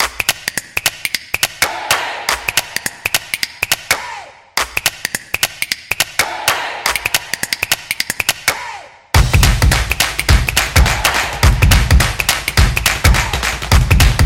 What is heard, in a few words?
A Percussion Beat Upbeat; graffiti